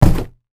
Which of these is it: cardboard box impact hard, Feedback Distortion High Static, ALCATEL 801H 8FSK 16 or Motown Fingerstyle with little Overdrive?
cardboard box impact hard